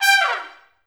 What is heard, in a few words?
G4, Pop Brass; Best Service; ProSamples vol.19; AKAI